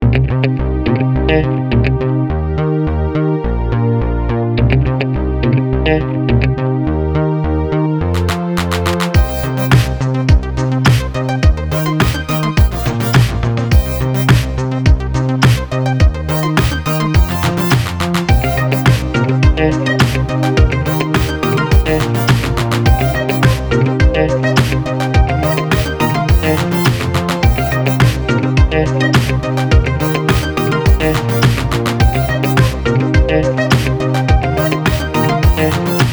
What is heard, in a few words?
80s synth pop